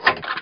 LEVER

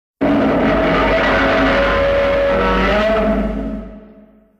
Roar; Godzilla 1954

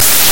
Airplane

